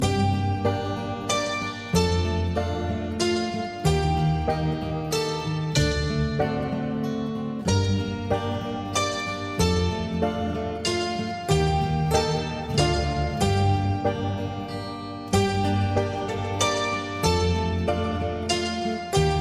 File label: great music